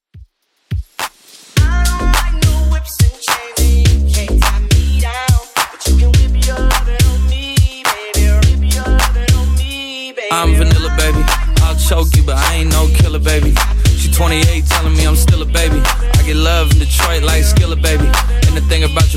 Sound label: Top40 Redrum 105bpm